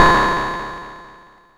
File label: Cymbal, Nord Modular Drum Samples; Yawgmoth